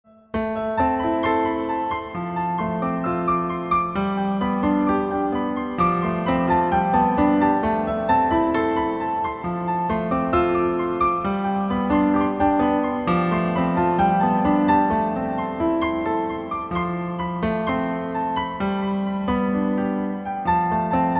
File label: play piano